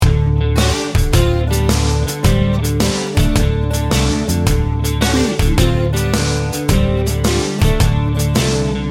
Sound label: big wheel trucker loop